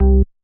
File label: bass, programmable speaker